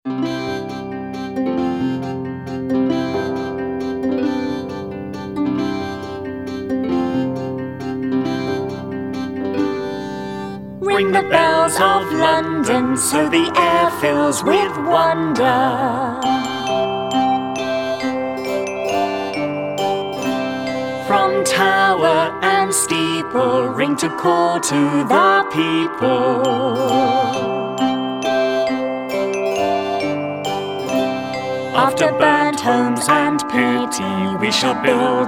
ring the bells of london vocal

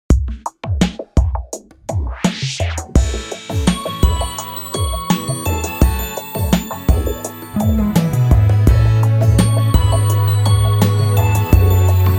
instrumental; a midsummer nights dream

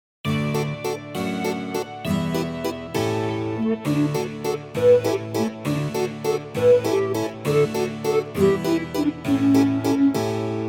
remember remember instrumental; gunpowder plot

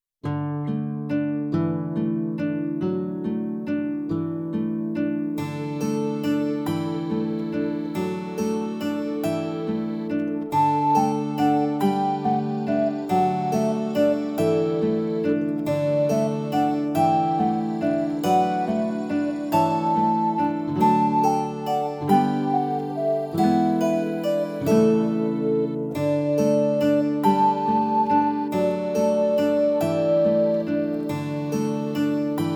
flow flow flow backing

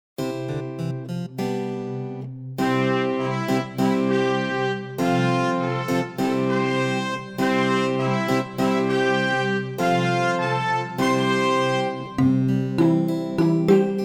lets get building instrumental; great fire of london

